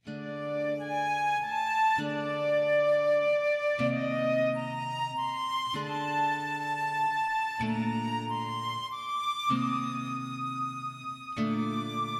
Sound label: Symphony in Raga Jalarnavam